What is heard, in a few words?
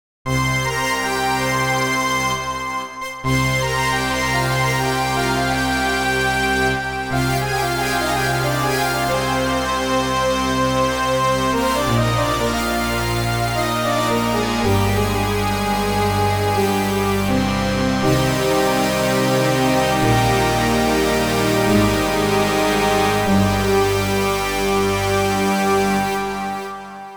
basic lead sound; the digital music